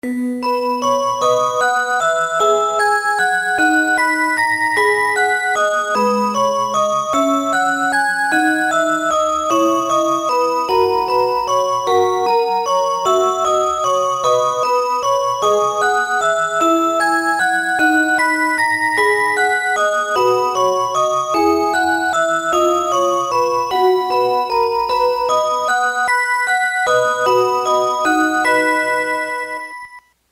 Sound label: rhythm song, clocks